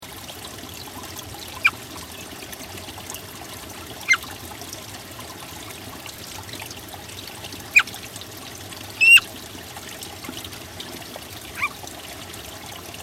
Odorrana utsunomiyaorum is calling

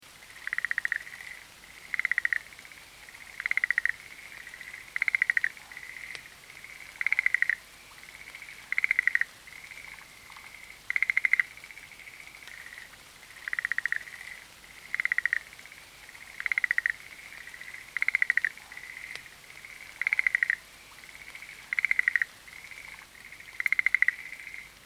Rhacophorus Schlegelii Is Calling

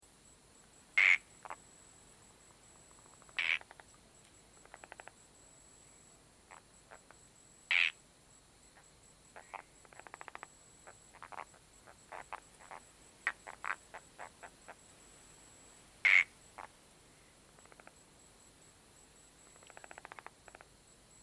Polypedates leucomystax is calling

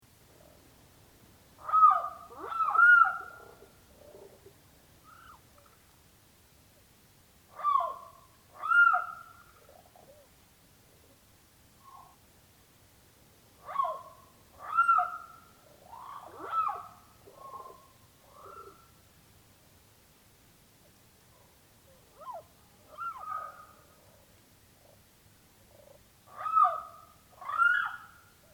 Odorrana Ishikawae Is Calling

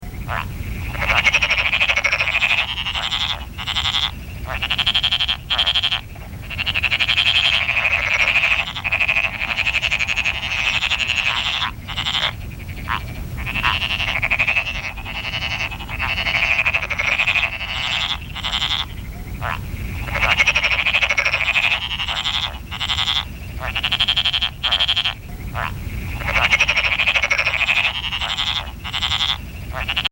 Pelophylax Porosus brevipodus Is Calling

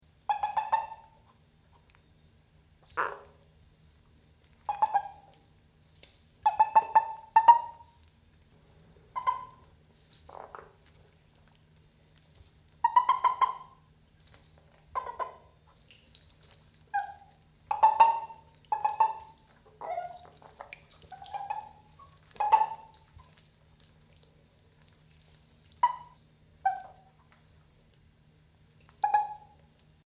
Bufo Gargarizans miyakonis Is Calling

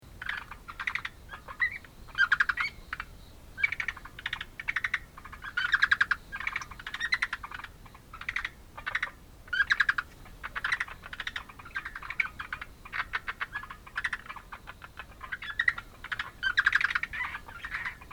Rana Kobai Is Calling

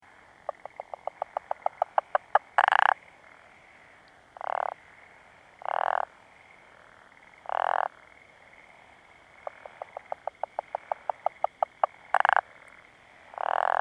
Glandirana Rugosa Is Calling

